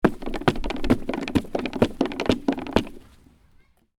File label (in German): Hüpfteil